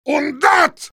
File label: meleeing; heavy